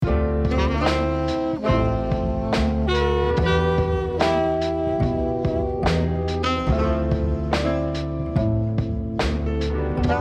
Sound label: music